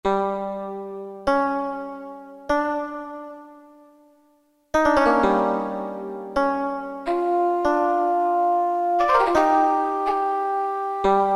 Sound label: Japanese Duo; Roland PN-D50-02